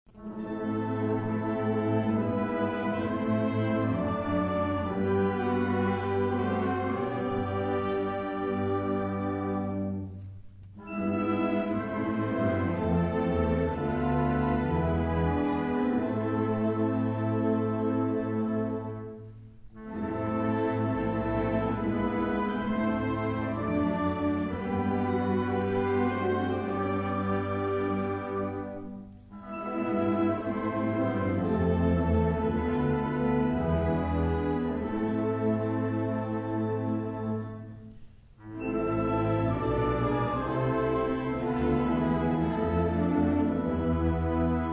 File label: Wind Ensemble; LAVC; All Solch Dein Gut Wir Preisen